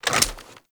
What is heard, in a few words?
Flak Cannon Unequip; Warframe; UT Flak Cannon